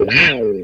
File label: GTR WOW, Funk Gtr & Wah; PlatinumPhattCD2; E-MU Producer Series Vol. 8 – Platinum Phatt